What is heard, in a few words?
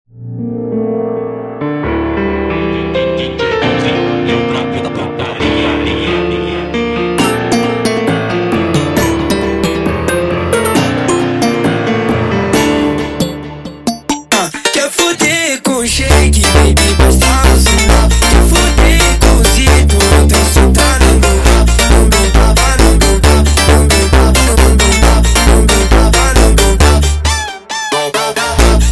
Montagem sped Up